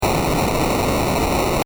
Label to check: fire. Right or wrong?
Right